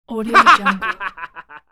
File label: male short burst laughter